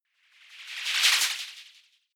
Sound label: whoosh simple flutter futuristic high